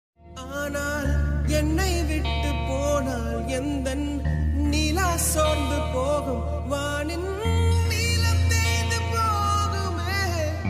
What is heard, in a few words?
tamil love sad songs